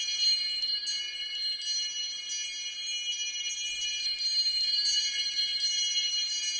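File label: big win bells loop, slot; silverback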